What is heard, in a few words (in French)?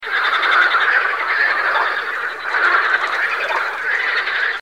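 "grieuse", oiseau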